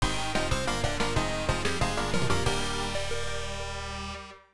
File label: Disapear, Game Over; steam heart s; pc 9801 opna